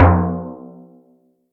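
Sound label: Rubber Oil; Yamaha DTXpress; Effect 1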